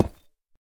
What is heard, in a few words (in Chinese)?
深板岩 放置声